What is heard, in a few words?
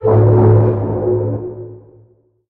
freighter alarm blare; no mans sky